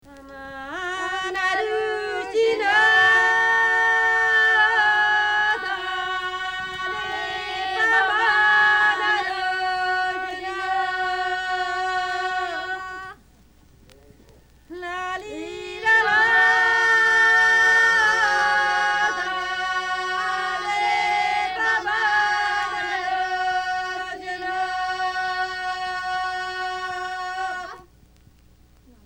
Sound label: wedding song; canto di nozze